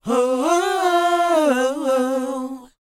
WHOA C, Vocal R&B & Dance; ILIO; Vocal Planet

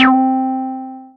Synths BASS

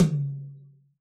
rock tom, Yamaha DJX Rock Kit

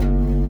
LIQUITAR; Spectrasonics; Metamorphosis

